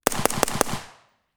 HK MP7 4.6mm on gun clean Four shots; Heckler & Koch; DPA4062

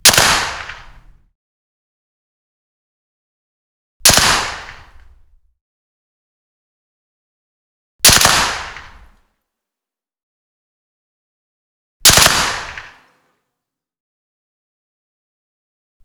HK MG4 5.56mm 35m in front wide clean Bursts; Heckler & Koch; DPA4062

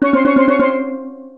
jingles STEEL; Kenney's Sound Pack; Music Jingles

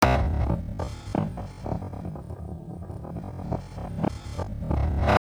Textures; 99Sounds; The Weird Side Samples

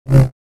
Saber; FXHome

